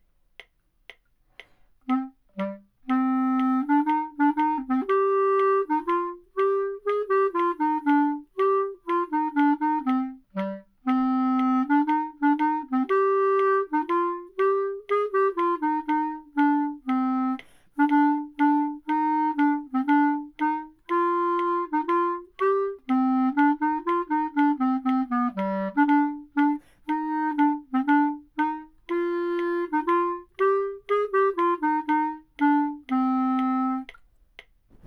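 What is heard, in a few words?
Metronome, Clarinet; Clarinet Student Resources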